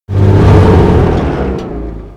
harvester stop; nod

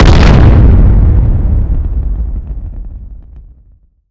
law explode

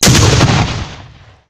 explosion improvised explosion

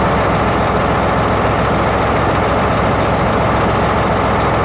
apoc idle

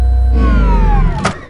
titan end down

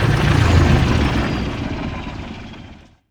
scorpion stop; nod

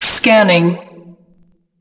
scanning

